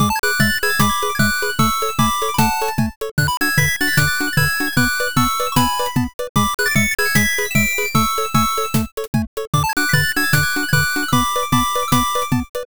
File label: bgm bright